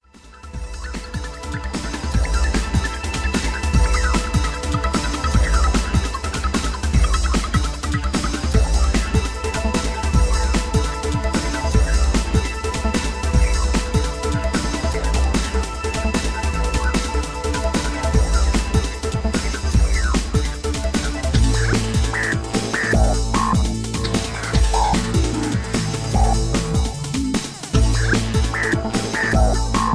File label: Ambiental Soundtrack